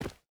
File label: footstep hide dirt run; Rust